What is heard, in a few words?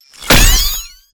abstract guns; fvtt sonniss gdc audio